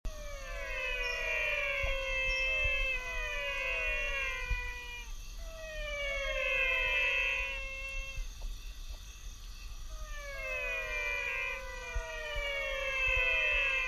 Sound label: Physalaemus albonotatus